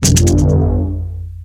Character Hit

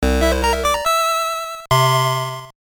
Jingle; Glocal Hexcite; WonderSwan

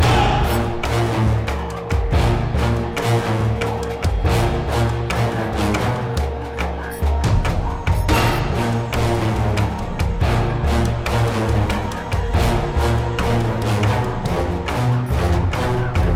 Battle Loop; Infinity Blade 3

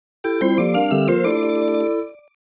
All Class Clear Jingle

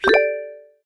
Achievement Unlocked; trivia crack; android

